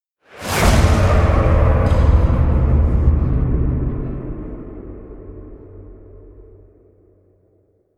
evil slams with whoosh; omen

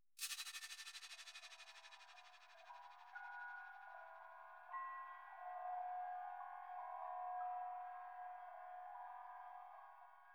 percussion only

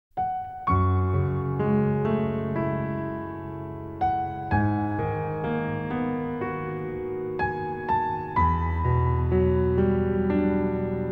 piano

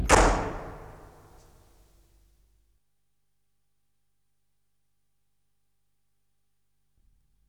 door closes; bullet uk

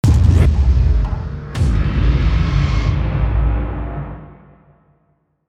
hit complex long

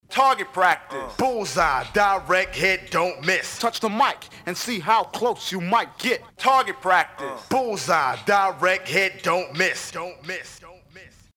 scratch kit

